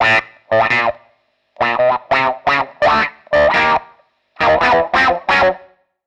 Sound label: Guitar line, 85bpm, hiphop; musicradar